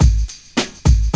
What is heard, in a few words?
8TEL A 106, loop, BPM; 100-114 BPM